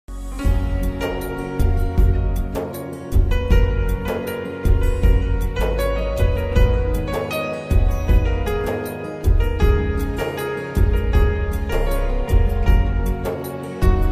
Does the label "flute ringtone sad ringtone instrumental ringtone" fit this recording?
Yes